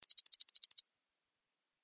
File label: wake up tones; oringz pack nine